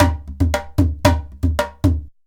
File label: IJEILU GROOV; Best Service; World Colours